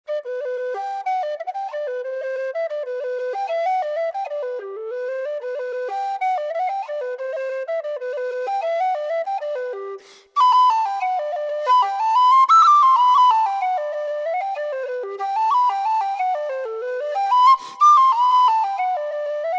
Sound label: Carbony Mezzo in G